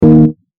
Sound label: error alert